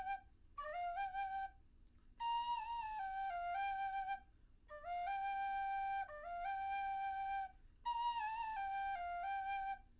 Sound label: nodrums liverec 250ms 5cm; SiSEC11; underdetermined